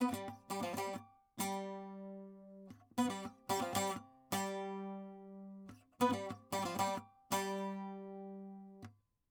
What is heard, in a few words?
saz